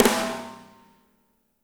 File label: MEDSNR, ROCKER KIT